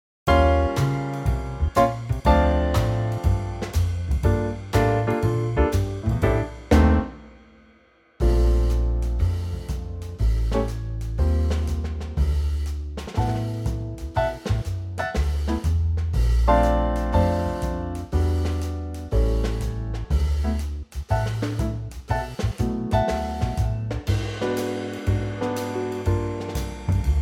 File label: D to Eb trio; key - D to Eb - vocal range - A to Eb